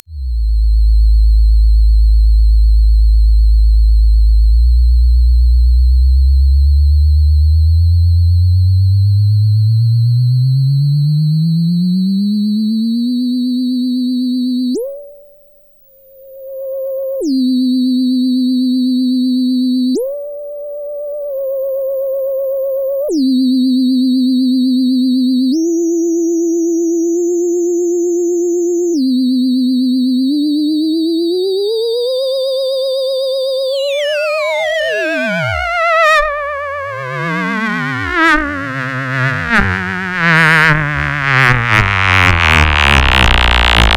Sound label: Micromoog